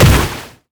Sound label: satchel charge; LoL; ziggs poolparty